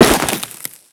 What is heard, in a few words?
Crewman Step Ice, Footsteps; Warframe; Corpus